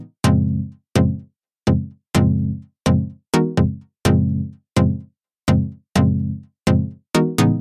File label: 126 Bpm Sharp Dub Synth